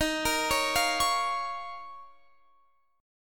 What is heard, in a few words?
F 7th Suspended 4th Sharp 5th D sharp